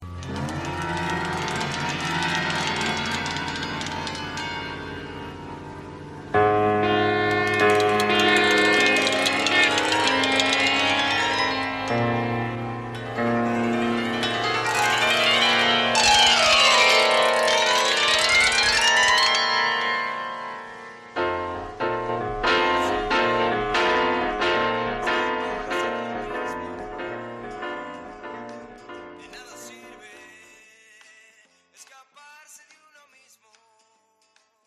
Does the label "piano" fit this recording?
Yes